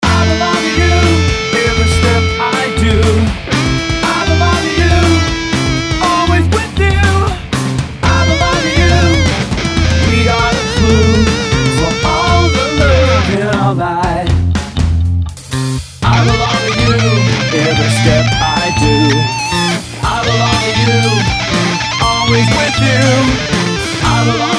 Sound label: Rock